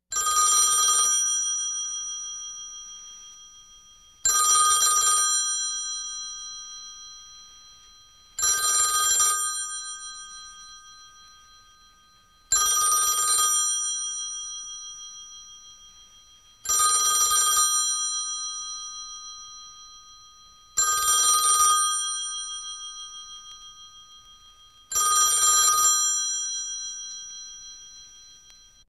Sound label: prize ring, ringtones; BLU G9; jingdian